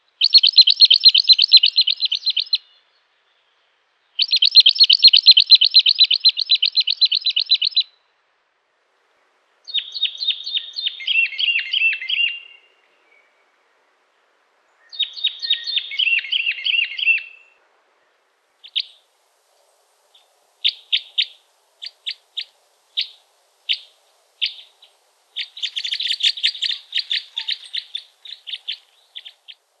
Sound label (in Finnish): pikkukäpylintu; janerikbrun